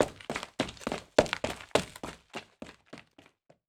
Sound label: run general